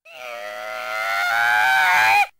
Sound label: screaming; RedDot